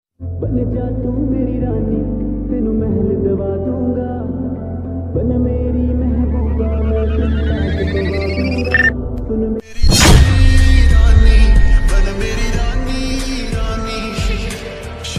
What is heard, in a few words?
slow hindi ringtone